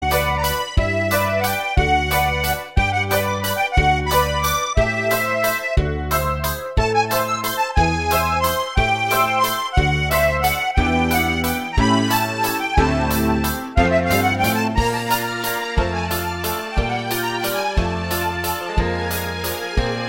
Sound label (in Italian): valzer musette